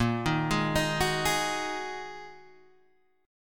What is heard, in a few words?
A sharp 7th Suspended 4th Sharp 5th, Guitar, DADGAD